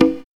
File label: CONGA